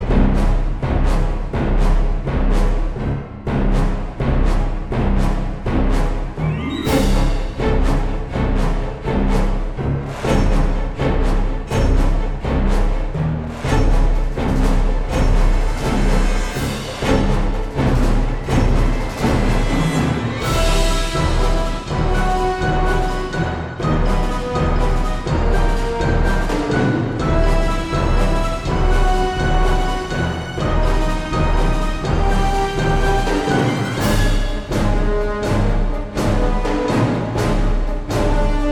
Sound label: Combat; Church Combat 1